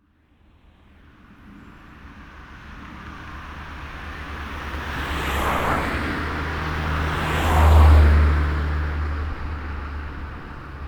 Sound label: Brevnov Cars Bike, field